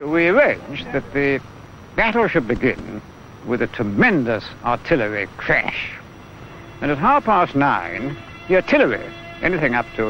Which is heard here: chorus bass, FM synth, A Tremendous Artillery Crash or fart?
A Tremendous Artillery Crash